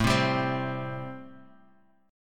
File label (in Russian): Boj Am7